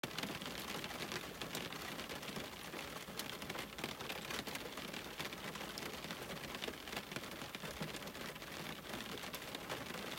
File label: soft rain on window glass; Water sound